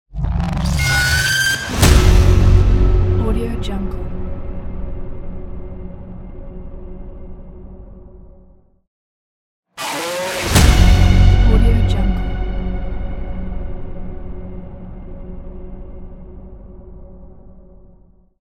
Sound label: halloween logo scream